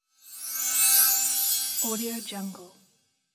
magic sparkle

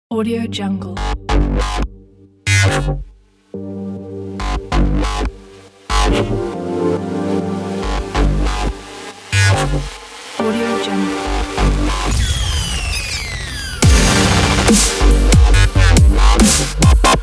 dubstep opener

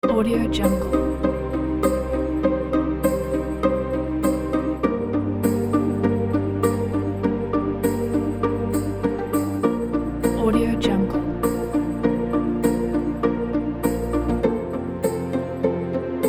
inspirational christmas cinematic uplifting

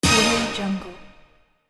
brass quiz show hit

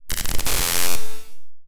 electricity arc; soundmorph

